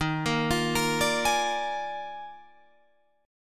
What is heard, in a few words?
D sharp Major 7th Suspended 2nd Suspended 4th, down, Guitar, Drop D